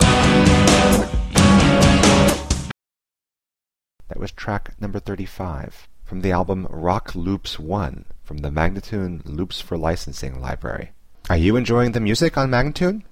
088 G, Rock Loops; Loops For Licensing

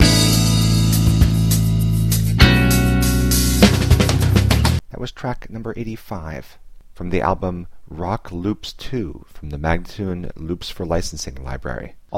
100 E spoken, Rock Loops

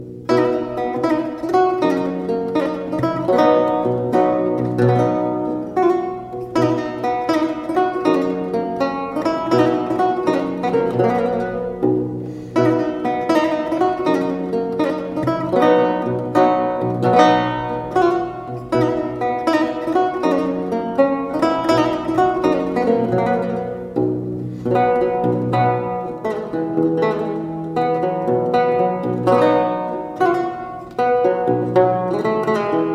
Lute; Besse bell (anon); Jane Pickeringes Lute Book

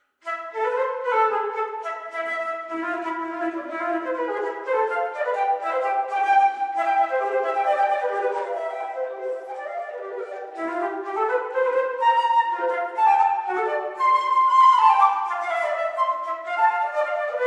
Partita in A minor Bourree Anglaise; BWV1013; Johann Sebastian Bach

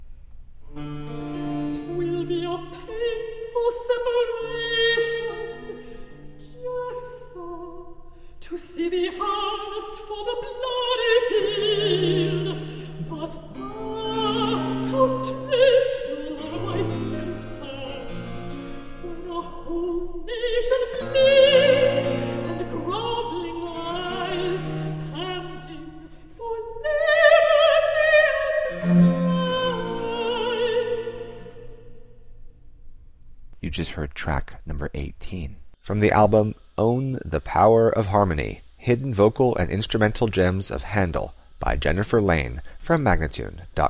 Recitative, vocal; 'Twill be a painful separation (Jephtha HWV 70 1751); GF Handel

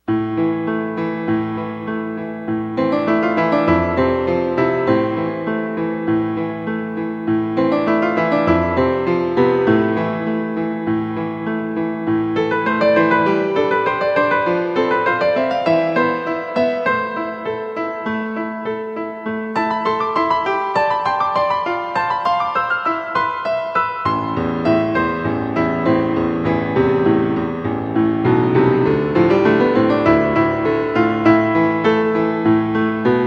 Prelude in A Minor, Solo Piano

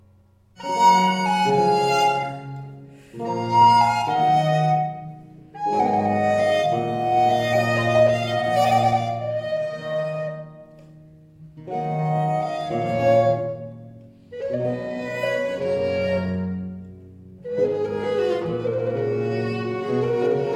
Adagio in G Major, violin; Ayres for the violin Book 3; Nicola Matteis

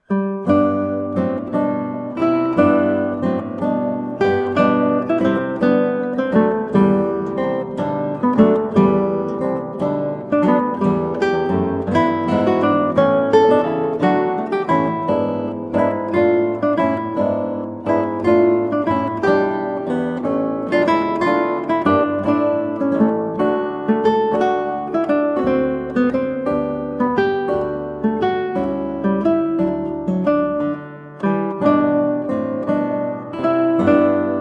Siciliana, guitar, spoken; Partita 2 in G TWV 41 G2; Georg Philip Telemann

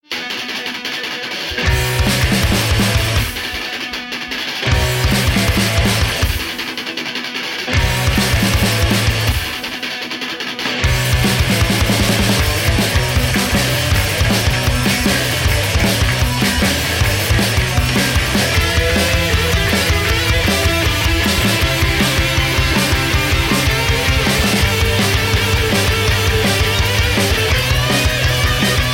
instrumental

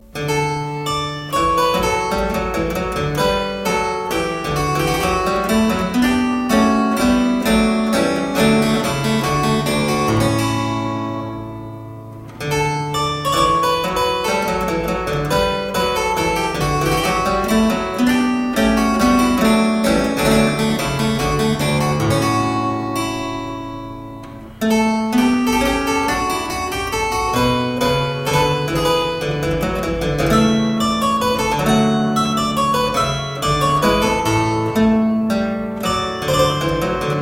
Suite no 6 in E Flat Major - Menuet, spoken; Johann Mattheson; The Twelve Suites of 1714

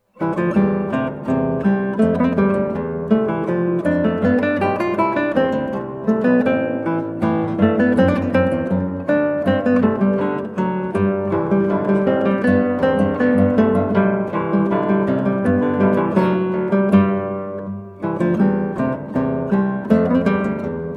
Andante, guitar, spoken; Sonatina Quinta TWV 41 A4; Georg Philip Telemann

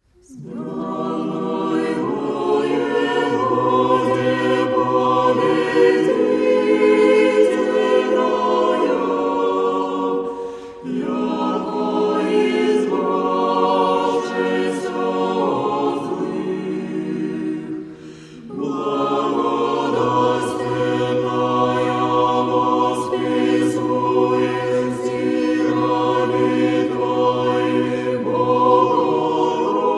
O Victorious Leader, Church Choir; In thy Dormition thou didst not forsake the World, (O Theotokos)

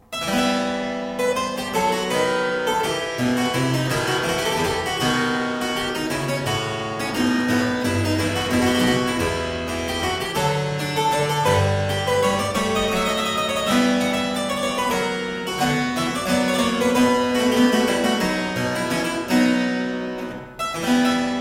Suite no 12 in F Minor - Courante, spoken; Johann Mattheson; The Twelve Suites of 1714

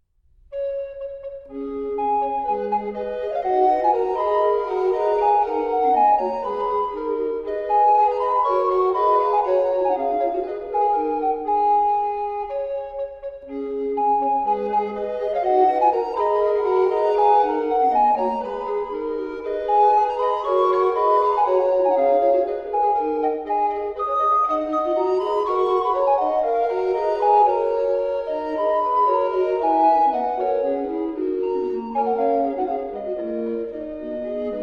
Recorder Quartet; Ungnad' begehr' ich nit von ihr; Ludwig Senfl

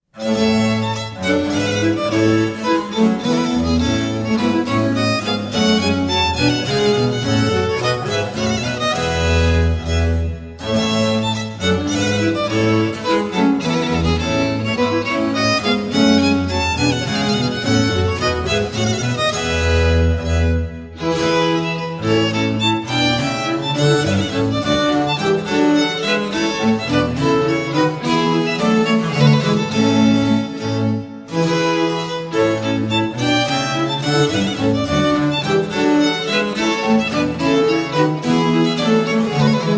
Courante, spoken; Mensa sonora Pars IV (C 72); Simplicissimus Ensemble

